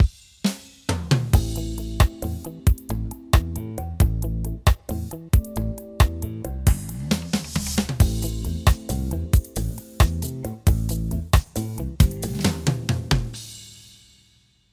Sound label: 6 8 nostalgy, loop fill